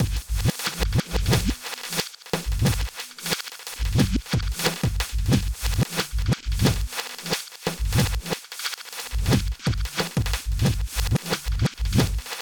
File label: Process Beat, Hip-Hop Beats